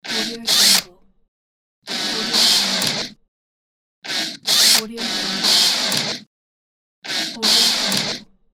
power drill

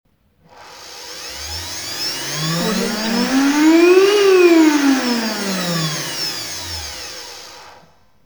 industrial machine

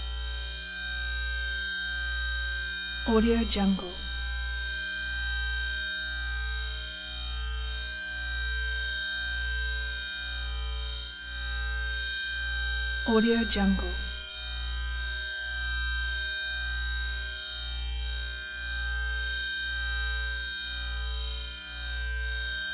drill tone piercing high and low pitch constant; prosoundfx

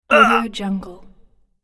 human character pain

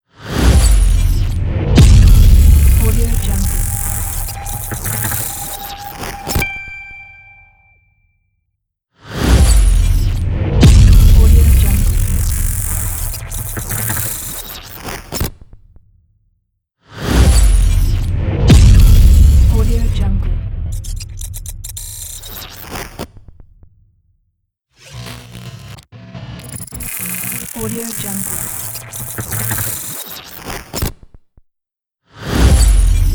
explosion glitch logo

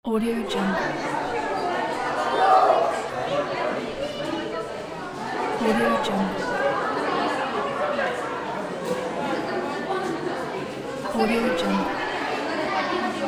ambience of the crowd people in the hall

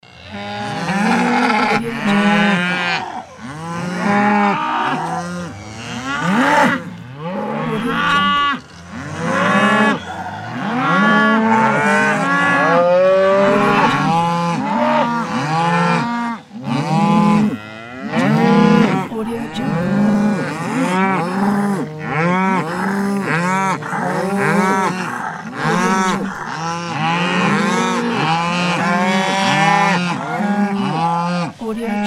angry cows loop

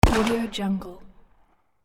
gun blast single outdoor; hollywoodedge

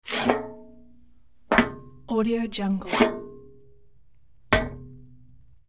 male scuffing shoes on steel floor; hollywoodedge

